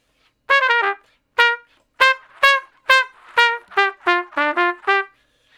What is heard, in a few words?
Trumpet Straight (G); Solos